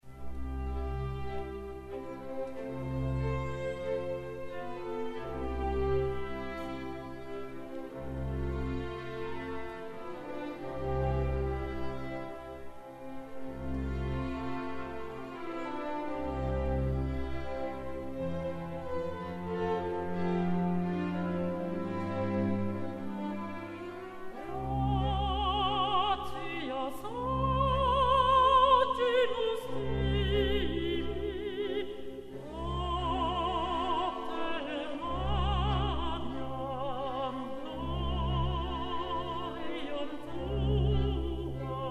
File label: Theresian Messe, Alto; Joseph Haydn; Direction Joel Suhubiette